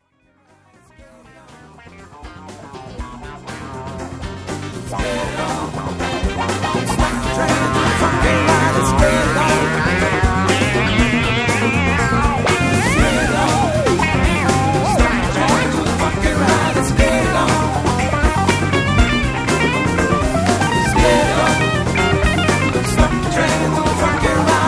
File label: Funky Train